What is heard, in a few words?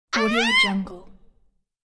cartoon manga girl scream